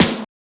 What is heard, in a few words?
Snare; FLPRO